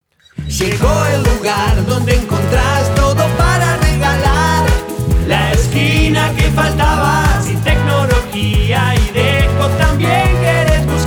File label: Jingle; Tokio